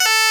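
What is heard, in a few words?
Scottish Bagpipes A2; NorthStar - Global Instruments VOL-1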